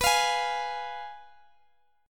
F Diminished B

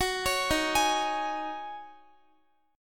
D sharp Minor 11th D sharp Guitar, chord; arpeggiated; JGuitar

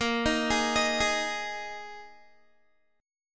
Bb 7th Suspended 4th Bb Guitar 4E,4A,5D,5G,5C,6F

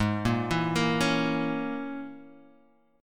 A sharp Minor 7th Flat 5th G sharp

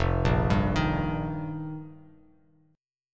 F 7th Suspended 2nd Suspended 4th, Bass Standard; F7sus2sus4; arpeggiated; JGuitar

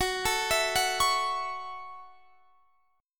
Gb Minor 7th Gb Guitar Drop D; down; chord shape

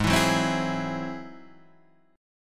Ab Augmented 7th, Ab+7 chord, guitar; strummed

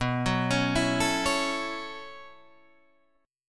B 9th Suspended 4th B down Guitar Drop D

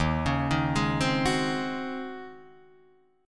Eb Minor 13th Eb down Guitar DADGAD; Ebm13 arpeggiated; JGuitar

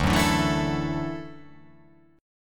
D Major Add 9th, Dadd9 chord; strummed; JGuitar

